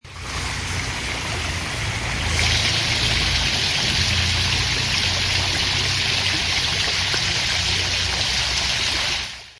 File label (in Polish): Fontanna